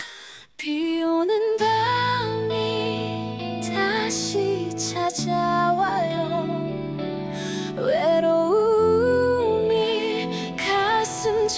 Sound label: rock ballad F; acestep